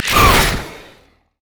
thorn plant; Zyra

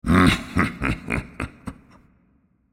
laugh; Mordekaiser; Character Voices - English

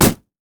blue minion mech basic attack; LoL

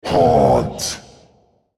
spellcast effort; Yorick; en US

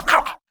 wolfman grunt; LoL; monsters

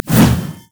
frostshot; LoL; ashe

